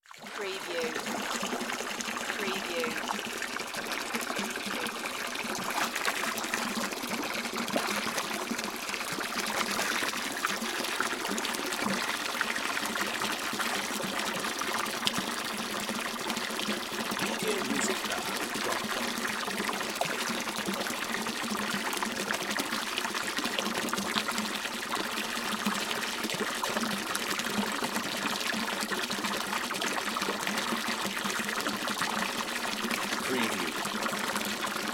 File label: Water Hose Pour Pool; Sound Effects Bible